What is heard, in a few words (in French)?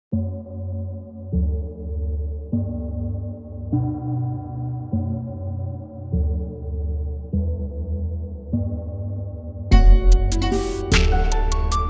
accordeon